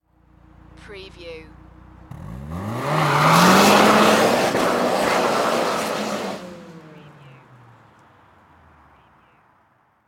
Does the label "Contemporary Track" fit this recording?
No